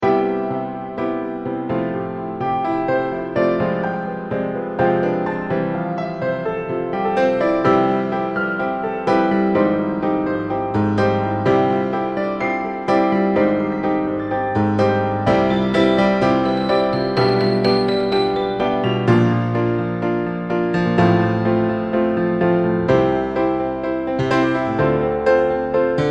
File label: playing piano